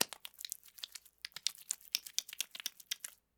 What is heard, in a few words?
Rubbing Lotion on Hands; Fairlight Sound Lib